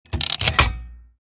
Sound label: Casino slot machine reel start